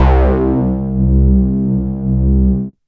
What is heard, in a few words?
Synth Bass Single Note; Basses